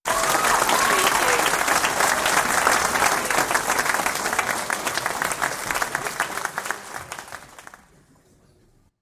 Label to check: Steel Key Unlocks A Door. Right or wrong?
Wrong